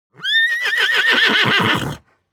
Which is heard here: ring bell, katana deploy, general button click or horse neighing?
horse neighing